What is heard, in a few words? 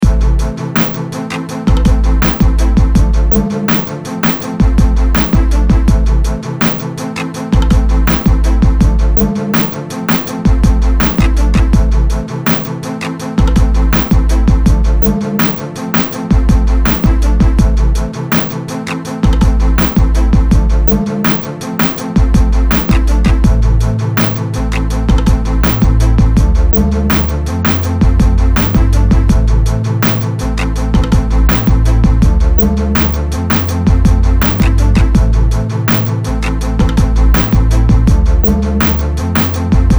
groove